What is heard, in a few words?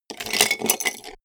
cup of pens; bueroarbeit